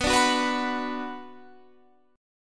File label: B-Minor, Guitar, Standard; strummed; JGuitar